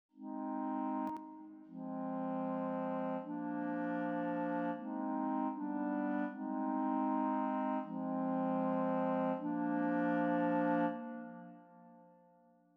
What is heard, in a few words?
Clarinet